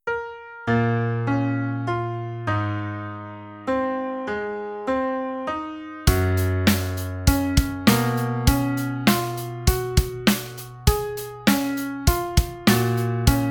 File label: Piano Sad Drums